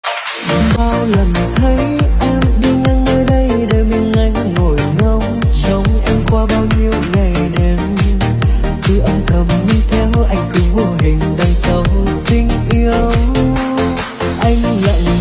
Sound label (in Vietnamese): Nhạc Trẻ Remix EDM